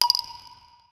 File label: a short clean low pitched